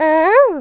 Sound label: Ooh; Beth: "Ooh!"